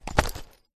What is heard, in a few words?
game leather bag open mechanical gear mechanism accent; ancient game